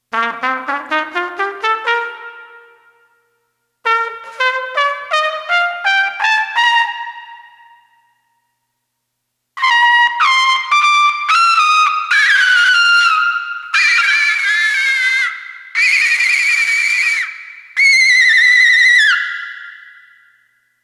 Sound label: high note